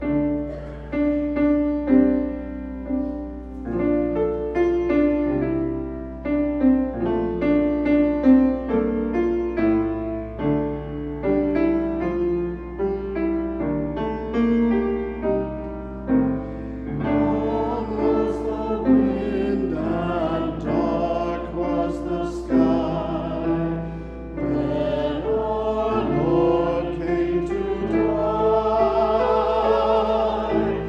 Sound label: choir